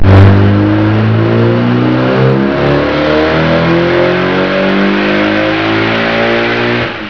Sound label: dyno run